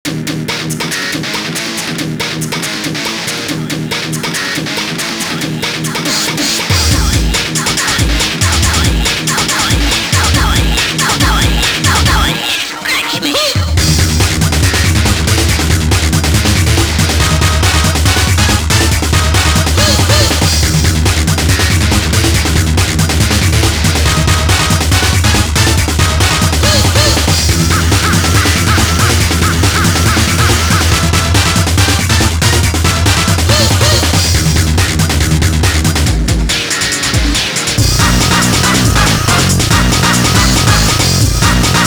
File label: Metal Scratchin